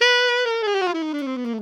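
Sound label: ALTO long fall B 3, Saxophones; Giga Samples Collection; ALTO STEREO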